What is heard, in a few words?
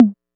Tom; Percs